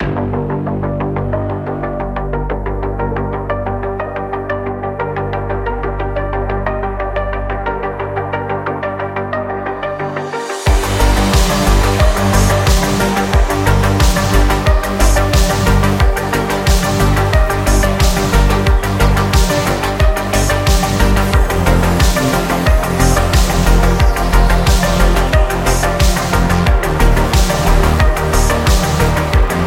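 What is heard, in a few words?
Retro Game, Modern lo-fi and retro background music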